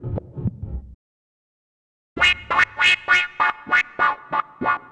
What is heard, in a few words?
Beatnik Hop; Sonic Foundry (Sony Creative Software) - Stylus Pressure Urban Grooves on Digital Wax